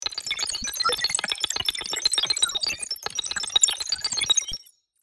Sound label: Futuristic text generation medium